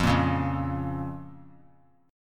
F Suspended 4th Sharp 5th F; strummed; JGuitar

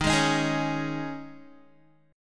D sharp 7th Suspended 4th, Guitar; D#7sus4; strummed; 11,11,13,13,13,x; 4E,4A,5D,5G,5C,6F; chord shape

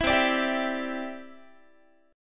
C-Major Add 9th, Guitar; Cadd9 strummed

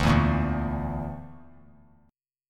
D Suspended 2nd Sharp 5th D; Dsus2#5; strummed; JGuitar

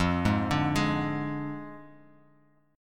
F Minor 6th F down Guitar Open F; Fm6; arpeggiated; JGuitar

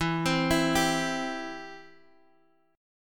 E Minor Sharp 5th E down Guitar Open D

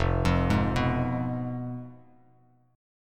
F Diminished F down Bass Standard; Fdim arpeggiated; JGuitar

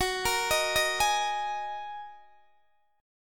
F sharp 6th Add 9th F sharp Guitar Open D

